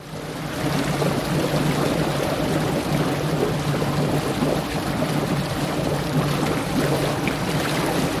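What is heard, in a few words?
Water Against Moving, bow wash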